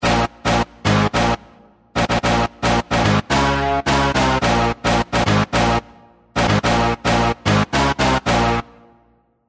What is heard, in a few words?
BASSES POWERSHOCK